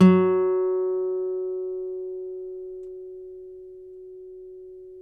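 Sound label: nylon, FLAMENCO, Guitars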